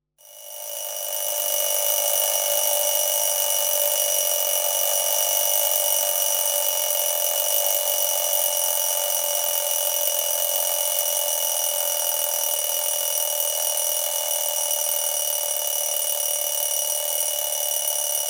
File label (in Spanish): reloj